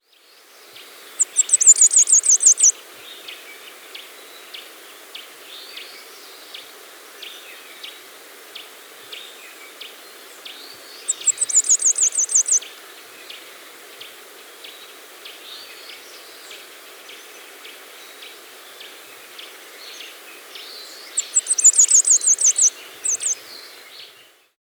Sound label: Blue-Capped Tanager, Thraupis, Nature's Songs, S. America; Thraupis Cyanoc